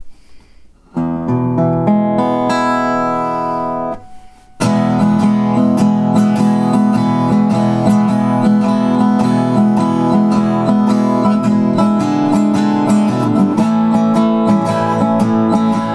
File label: Sitka Madrone Blues in E